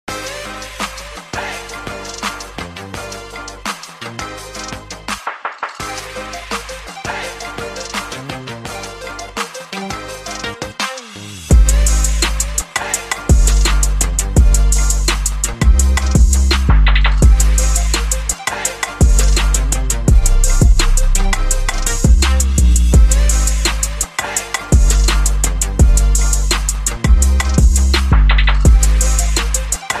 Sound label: Twist Trap Remix